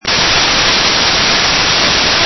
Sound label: Taps